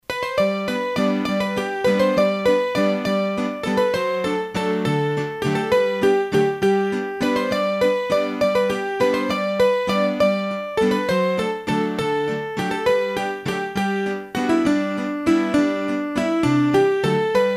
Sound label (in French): La Bourree Tournante, hurdy gurdy; Massif Central; Bourrees a 3 Temp